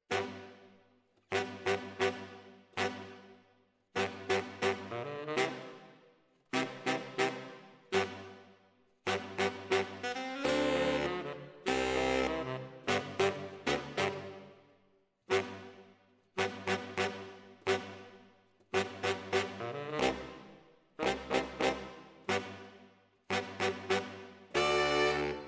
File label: ORTF SCHOEPS, piano